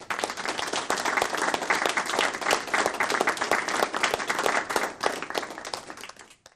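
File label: Applause Small Crowd Indoor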